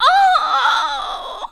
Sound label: Female Death